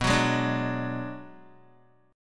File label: B Minor 6th B, Guitar; Bm6 strummed; 6,9,8,7,6,x; JGuitar